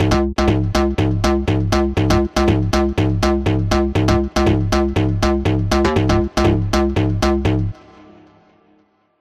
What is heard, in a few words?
BRAZIL BASS